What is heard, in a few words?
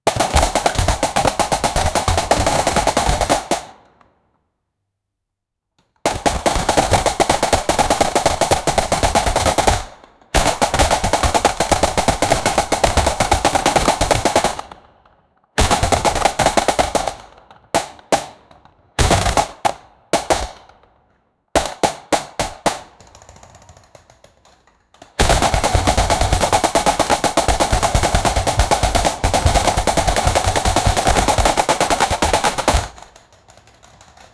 bolter shooting; war